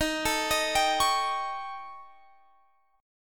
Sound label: Eb 7th Flat 5th, Eb, Guitar, Drop D